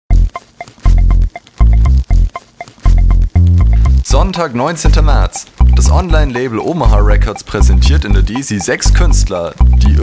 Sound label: jingle; omaha; nexo live